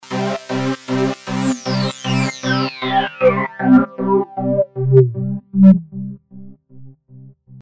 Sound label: pulse mod; obx; vst audio; opxvst